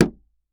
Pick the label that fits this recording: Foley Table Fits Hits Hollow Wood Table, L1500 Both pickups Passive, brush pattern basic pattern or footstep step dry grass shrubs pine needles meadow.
Foley Table Fits Hits Hollow Wood Table